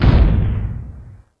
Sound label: soil metal